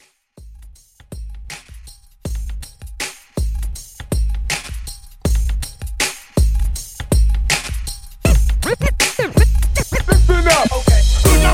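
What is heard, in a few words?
Old HipHop with Hype 160 Bpm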